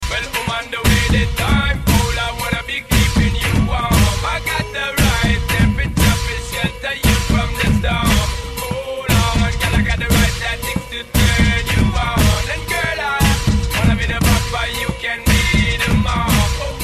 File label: Dhol